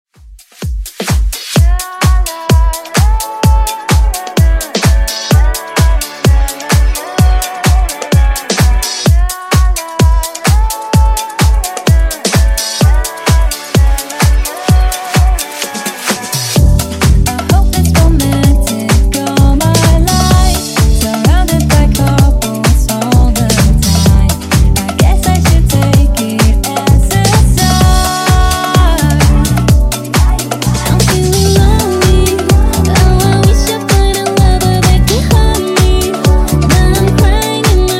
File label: Funk Nudisco, 128Bpm